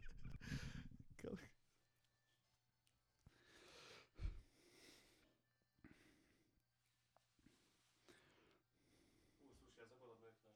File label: vocal